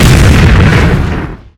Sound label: wasp launcher explode